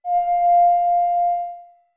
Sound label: Notes Ocarina F long; OOT